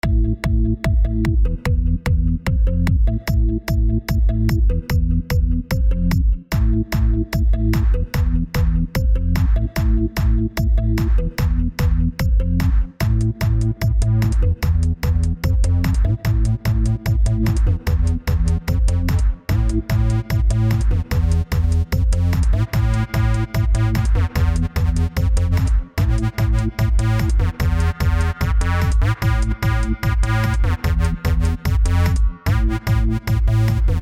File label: energy instrumental